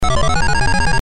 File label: noise